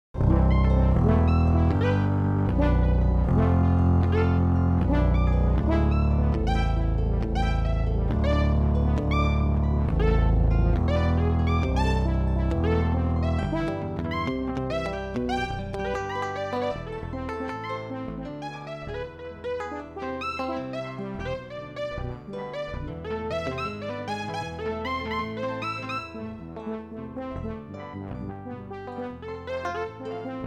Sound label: Polyrhythmic Syncopated Greensleeves; fractal music